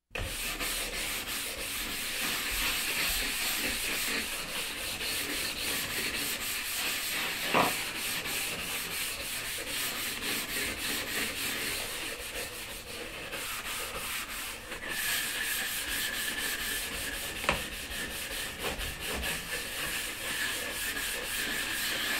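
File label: Scrubbing Floor With Brush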